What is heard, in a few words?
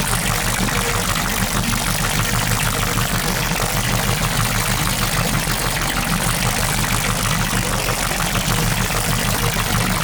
Experiment Riffle Beam Loop; Warframe; Weapons; Corpus